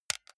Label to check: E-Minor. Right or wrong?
Wrong